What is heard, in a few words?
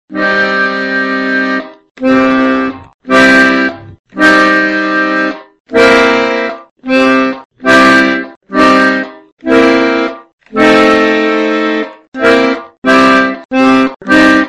accordian